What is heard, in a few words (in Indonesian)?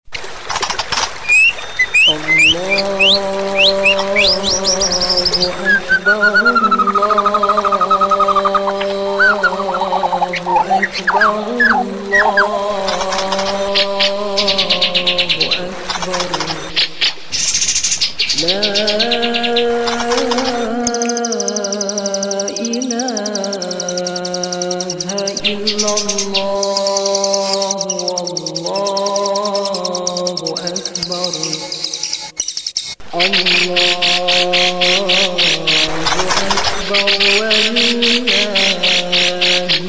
suara burung murai batu isian berlatar takbir